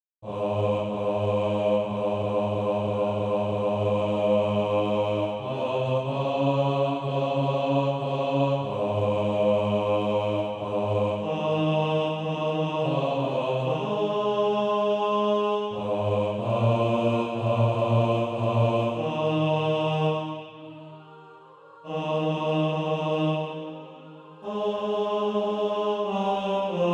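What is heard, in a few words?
bass, Practice Music; SATB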